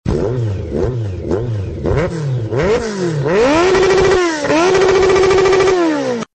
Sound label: Moto